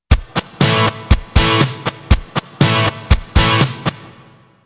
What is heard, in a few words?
distortion, guitar